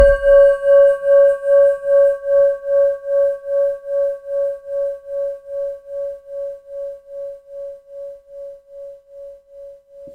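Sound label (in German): Klangschale Tibet Pluto; Planetenschalen; Planetentoene; Klangproben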